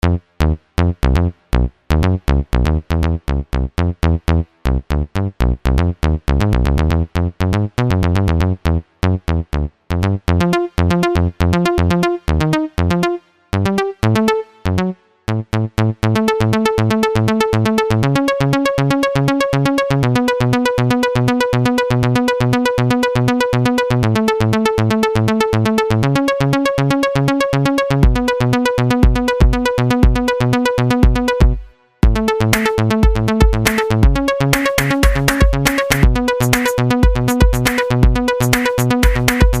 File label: bassy electro stuff